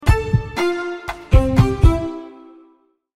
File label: logo